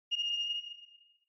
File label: Ticket Gate Beep Tone Far